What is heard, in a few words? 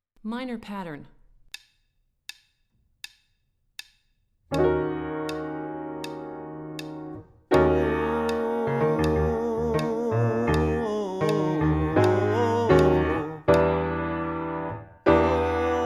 Minor Pattern High, warmups